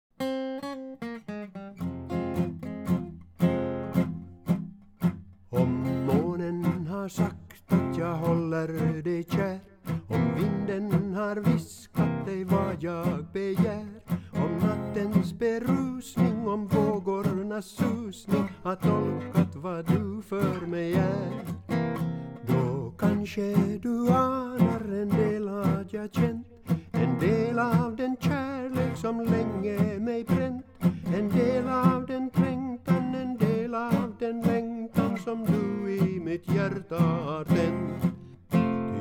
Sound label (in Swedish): tenor